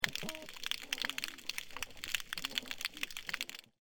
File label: snapping shrimp